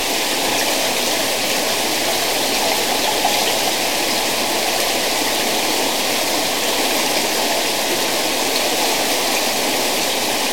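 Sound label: Water Torrent loop; silent hill homecoming 2008 gamerip pc ps3 x360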